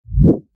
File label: scroll